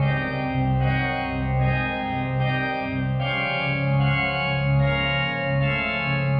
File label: Video Organ 75-C, horrorscape samples 75bpm